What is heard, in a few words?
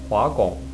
hua gong, Any transverse gong; Its length varies according to its position in the bracket set.